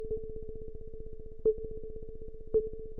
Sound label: pulse